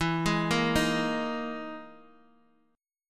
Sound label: E Major 7th Flat 5th, Guitar, Open D